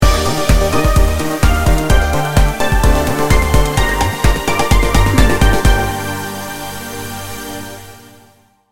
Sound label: hi-nrg sting bumper